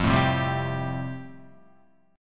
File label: F Major Add 9th down, Fadd9 chord, Guitar; strummed; JGuitar